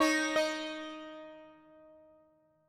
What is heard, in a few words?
Sitar, 084 Ebmin